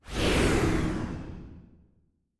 Woosh

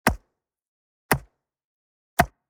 Axe Wood Chopping

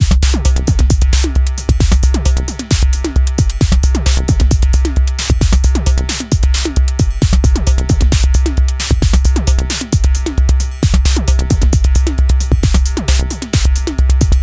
133 Bpm Attack Loop Electrified Analog Kit

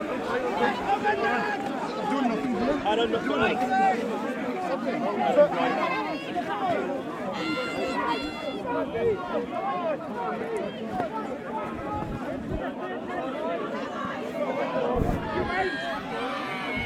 Crowd Shouting On The Street

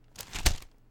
Straightening Newspaper

